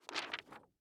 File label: Page turn sound